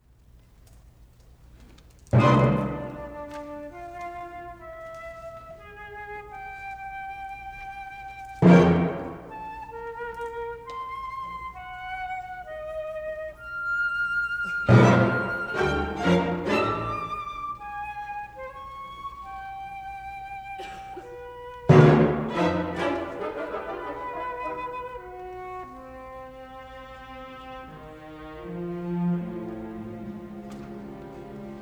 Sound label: Flute Concerto I Dialogue excerpt